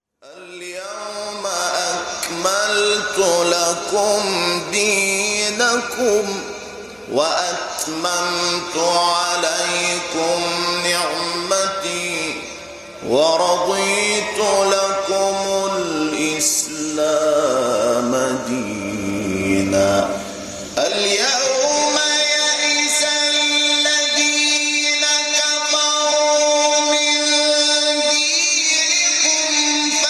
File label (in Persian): تلاوت آیه ۳ از سوره مبارکه المائده; محمود الشحات انور